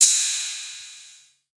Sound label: Ride HH Med; Drums